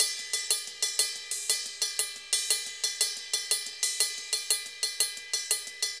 Ride, Festa Latina, Drums & Percussion

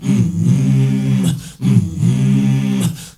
MALE HUM; Spectrasonics; Heart of Africa

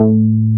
CROMA, Synth Bass; BA1 Synth-Basses; Sound & Vision - Gigapack I CD 2

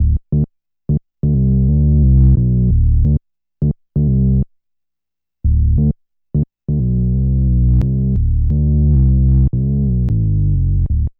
BASS LP; East West - Phat & Phunky CD2; 90's Sample CDs

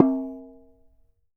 CLAY, Cameroon

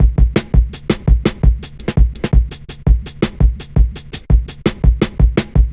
Drum Loops, Jungle

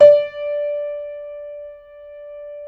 JAZZ D4, Keyboards Acoustic; USB Soundscan vol.17

